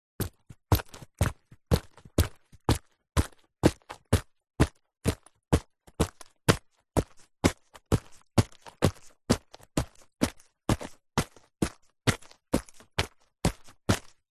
foley footsteps shoes female boots leather buckle solid ground walking